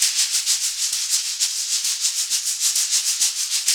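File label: perc african shaker, shaker loops; Roland LCDP14 Africa VOL-2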